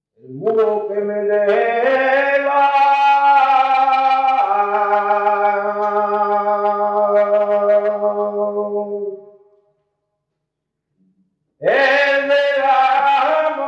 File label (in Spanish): Cantes de Trilla, flamenco